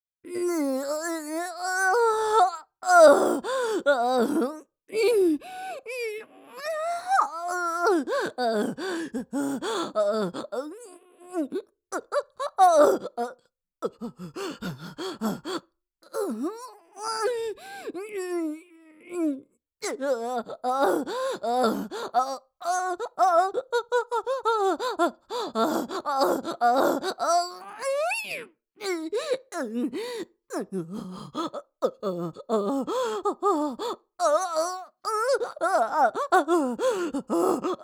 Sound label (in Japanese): 踏ん張り 喘ぎ声, ボイスのみ